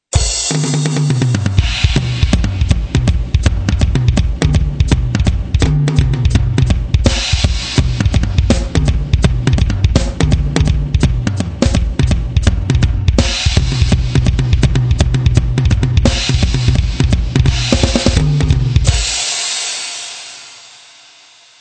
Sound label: tom